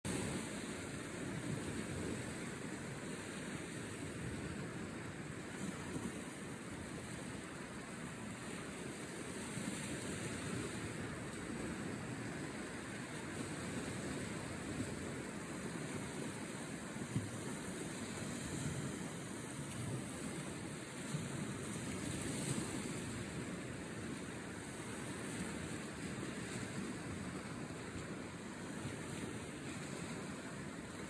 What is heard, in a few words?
ocean